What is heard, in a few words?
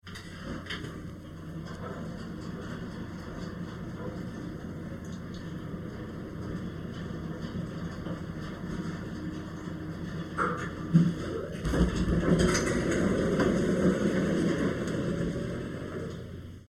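Moving Platform Sound; super spys breakfast sea cruise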